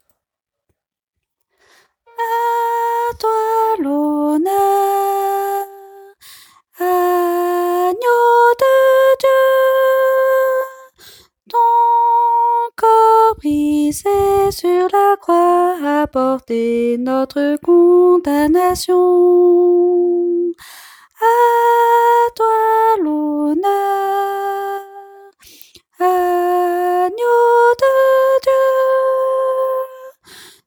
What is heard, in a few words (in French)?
soprano